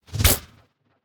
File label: Spiters